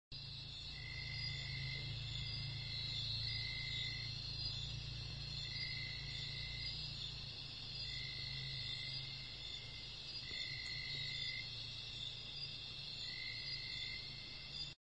bosque noche

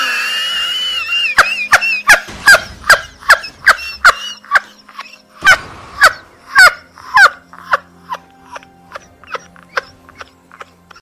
risa

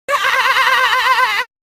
risa latino; bob esponja latino

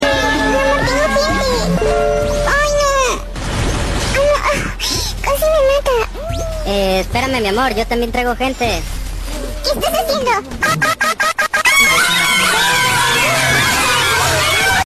gemidos de ardillas

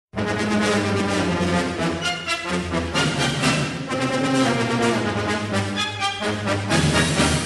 musica; cronica tv

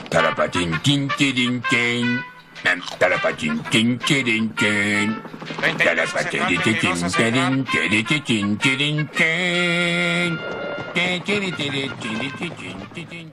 musica ascensor; homero

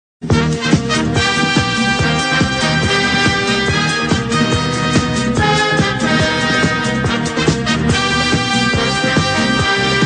marcha feliz; venevision

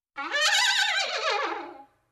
trompeta funny

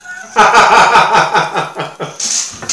risa

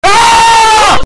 supergrito; willyrex

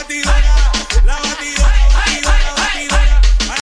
batidora remix

